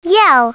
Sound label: yell